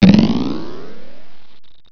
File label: Close sound; Simple Diary 1.3.7